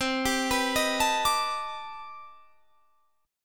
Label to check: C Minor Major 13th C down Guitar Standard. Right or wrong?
Right